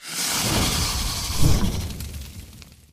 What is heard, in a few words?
fire; kak priruchit drakona; vysizhivat yajtsa